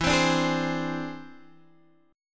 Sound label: E Augmented 7th E down Guitar Standard; E+7 strummed; JGuitar